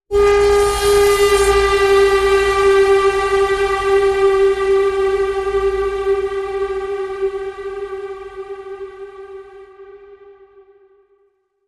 Warning Bend

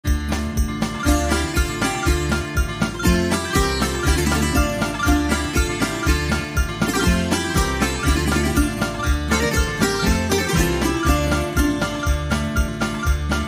Fast Bouzouki

